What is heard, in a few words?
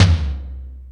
Metal Drums, E-MU Pro-Cussion